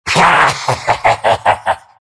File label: big laugh; dota; undying